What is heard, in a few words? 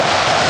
Sfx Wintergames